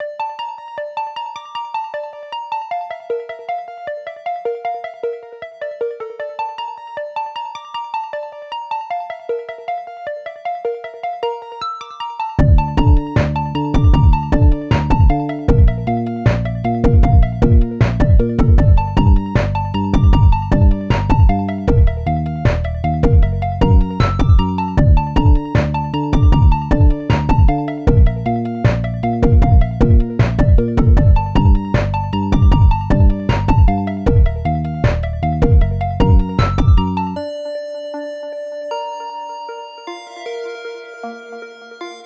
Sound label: 8bit title